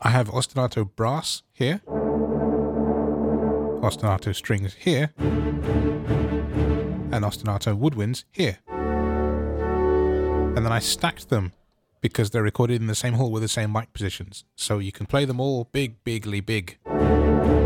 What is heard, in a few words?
Ostinato; Sonokinetic